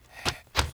war run, Mob

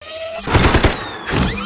WAR ATTACK; Mob

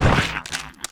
PEACE DAMAGE

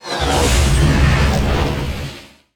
Attack, Mob; INFERNALDRACO

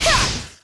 Summoner attack

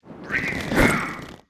WAR POWER UP; BELGUARD; Mob

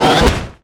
Damage; Draco; Mob

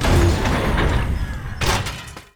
war die; NECROMETA; Mob

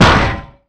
Gun Metal, Damage

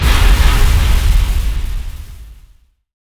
Flash Damage, bullet

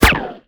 Rifle Skin, Damage

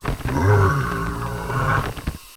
STONEBLOCK WAR IDLE

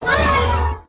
RECRUIT DIE; BARAS; Mob

